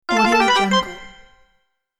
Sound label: funtime game bonus